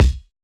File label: Hiphop kick; Reverb+EQ+Comp+Limit